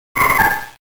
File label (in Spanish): Grito de Ralts